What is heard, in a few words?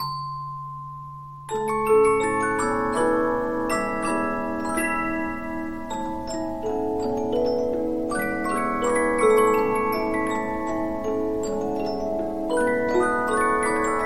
Polyphon, 15inch disc musicbox